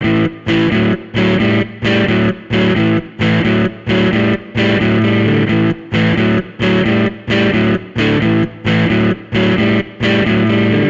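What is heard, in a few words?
Guitar Blues A major 88bpm; Guitar and Bass; Ableton; Factory Packs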